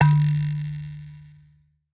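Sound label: RATTLE KALIMBA C#3; Heart of Africa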